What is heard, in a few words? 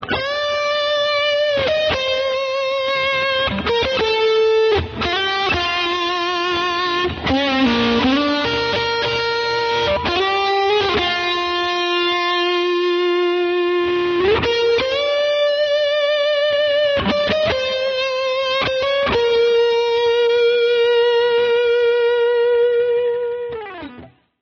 in Sus, pedals